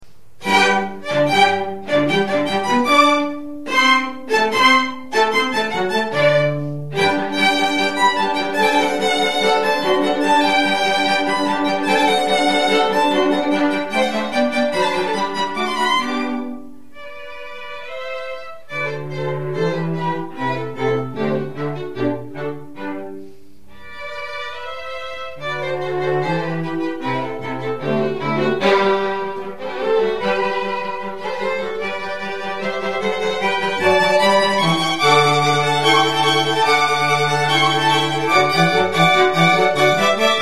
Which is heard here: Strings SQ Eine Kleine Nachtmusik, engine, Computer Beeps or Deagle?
Strings SQ Eine Kleine Nachtmusik